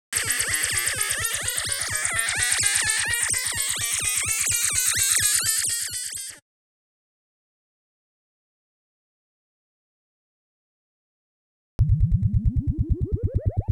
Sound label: EMS Seq, Rhythmic; Klaus Schulze Collection